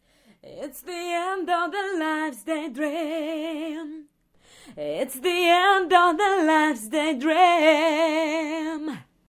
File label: Vocal Test